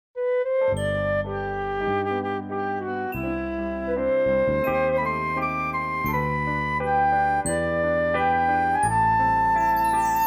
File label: violin, G, Ab